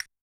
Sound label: Castanet; Yamaha DTXpress-II; Perc